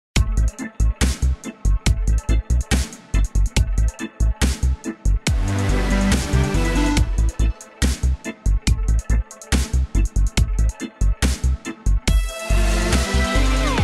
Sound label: Dub-Style Step; Club Penguin; Ultimate Jam Event Trilogy; Windows; Soundstudio Party